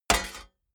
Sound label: shovel impact